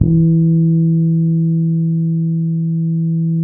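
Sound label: E 3, fretless harmonics, bass; Spectrasonics; Bass Legends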